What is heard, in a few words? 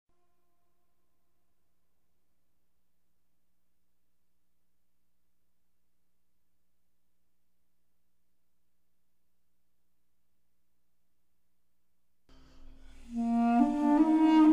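melody; Myst III Exile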